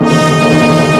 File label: HIT, synths; Rubber Chicken Software Sample Bank